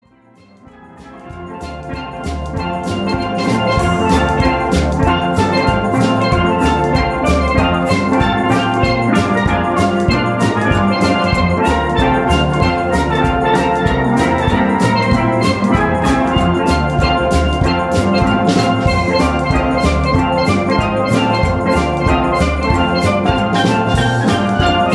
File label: Rock n Roll, steelband